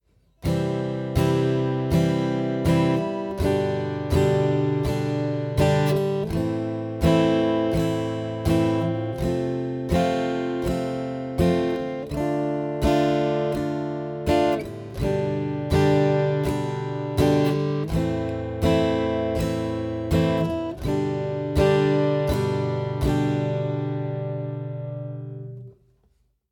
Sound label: Verse Chords, guitar